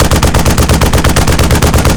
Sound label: apache single looped; cameraman3